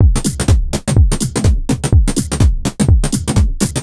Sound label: 125bpm, House Drumloops